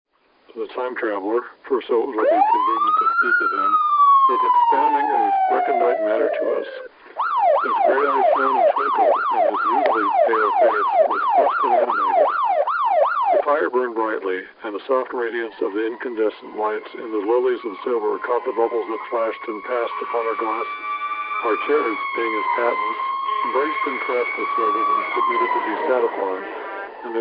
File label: time machine various noises; combined